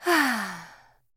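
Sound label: regretful sigh of a tired woman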